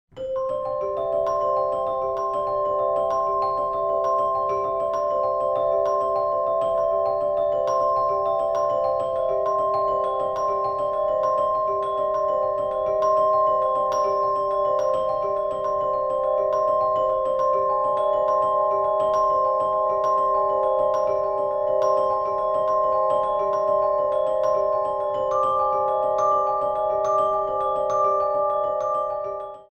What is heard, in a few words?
Pealing of bells